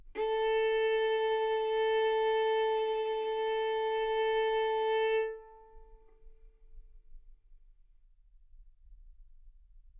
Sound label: Violin arco A4 B4; mf; sul A; stereo